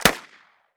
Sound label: m67 primer; explosive; garrysmod